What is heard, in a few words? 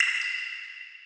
distant drip, water; ambient